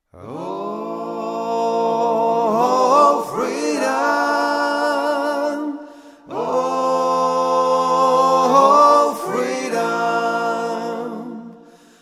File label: Tenor